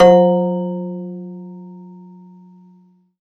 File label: Rubbery Piano, prepared piano; Total Piano